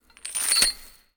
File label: iron chain drop